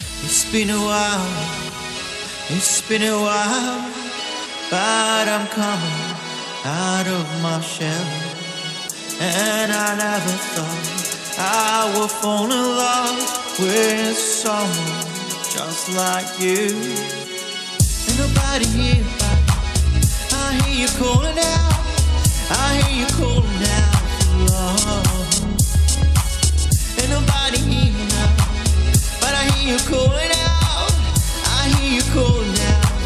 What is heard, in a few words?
singing power music 432 Hz